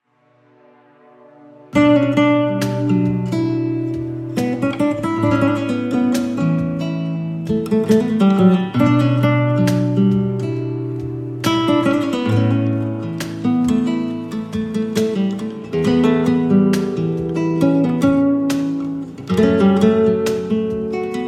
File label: Night Atmosphere, guitar; بهترین آهنگ بی کلام گیتار